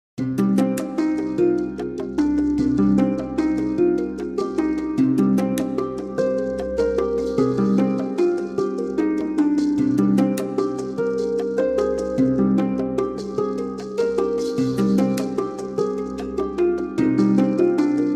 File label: Celtic Elf Music